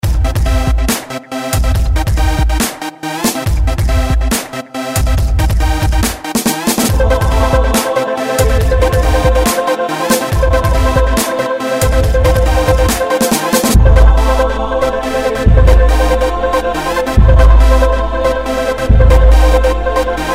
horror in band ringtone

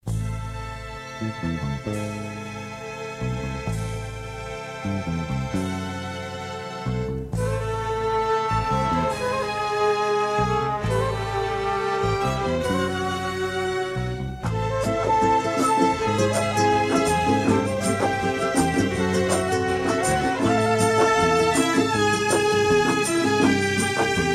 instrumental ringtone

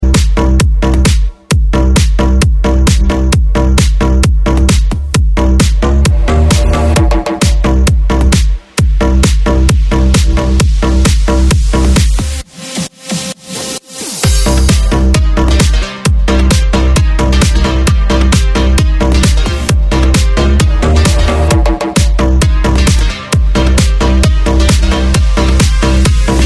floor house ringtone; music overturned

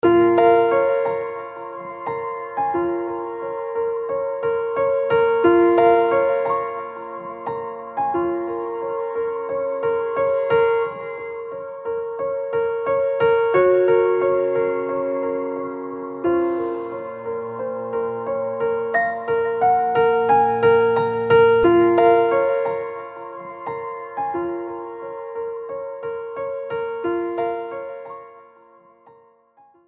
beats piano ringtone

